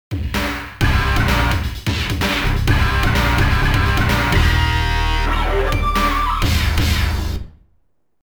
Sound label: Victory; king of fighters n.e.s.t.s. dream sound legacy